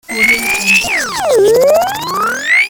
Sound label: rewind transition